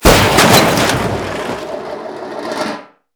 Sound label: metal trashcan over; Modern Warfare